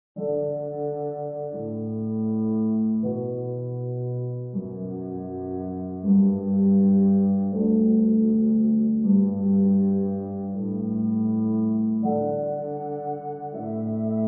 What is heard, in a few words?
Music Interior Classical